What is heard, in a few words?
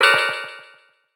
mascot pain